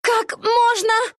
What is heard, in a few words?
death; dota2